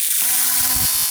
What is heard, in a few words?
ELECTRIC Shock Arc; Cielonos